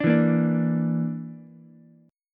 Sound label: Bb Major Flat 5th Bb down Ukulele, chord; strummed; JGuitar